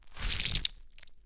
Slime

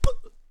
Punch

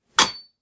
Metallic Cling

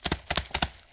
Monkey Running Paws

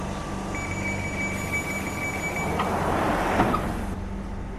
Door closing

